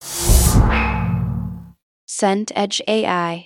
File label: sheet metal wobble